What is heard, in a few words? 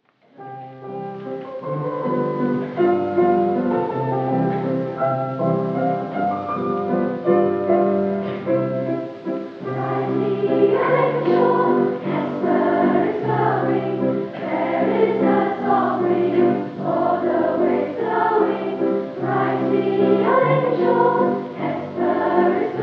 piano, Madrigal choir; Santa Lucia